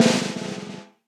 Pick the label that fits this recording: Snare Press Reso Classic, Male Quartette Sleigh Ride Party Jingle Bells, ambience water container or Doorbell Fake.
Snare Press Reso Classic